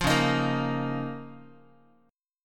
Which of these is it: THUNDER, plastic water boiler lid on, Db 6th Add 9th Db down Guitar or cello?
Db 6th Add 9th Db down Guitar